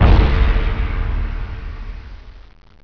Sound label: join